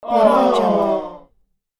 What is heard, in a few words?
disappointed crowd awww